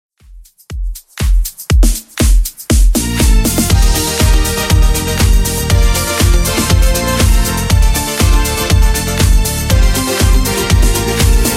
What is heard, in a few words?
90s Redrum 120bpm